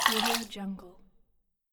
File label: small water splash